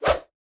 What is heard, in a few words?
fire, combat weapons